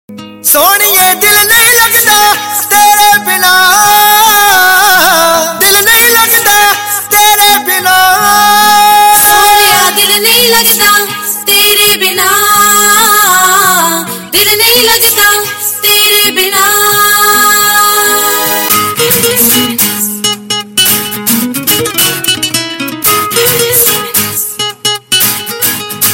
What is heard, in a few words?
punjabi ringtone, bollywood